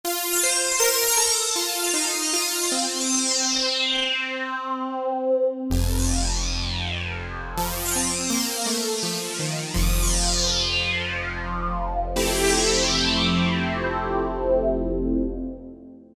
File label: Yowww, Generalmusic Equinox